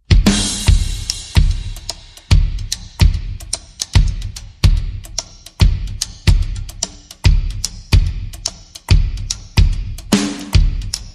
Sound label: Drums Bass and Percussion